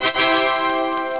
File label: end level; pang